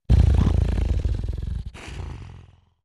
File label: monster growl, breathing